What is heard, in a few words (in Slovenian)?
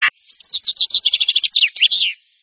scinkovec